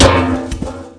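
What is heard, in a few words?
grass; Mafie 1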